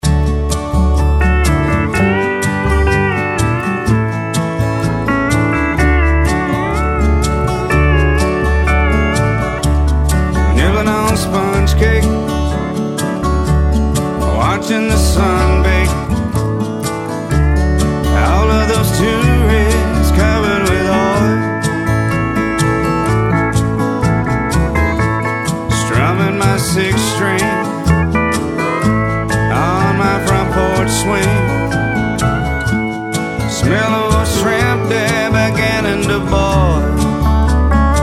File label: SOLO STEEL GUIT